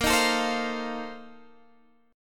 A sharp Major 7th Suspended 2nd Suspended 4th A sharp